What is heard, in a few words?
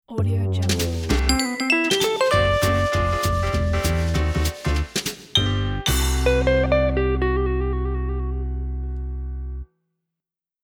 nostalgic jazz logo; drtimwaters